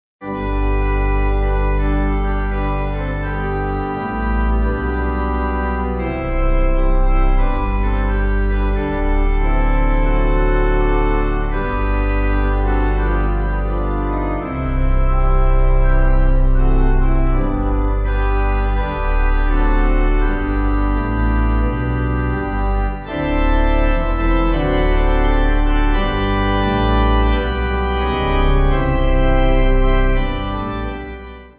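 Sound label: Pipe Organ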